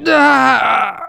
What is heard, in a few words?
pain severe; Civilian responses